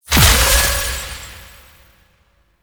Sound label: bleeding shards impact; Citrine